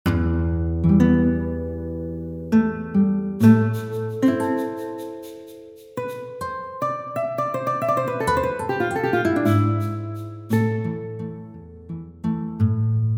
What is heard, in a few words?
waltz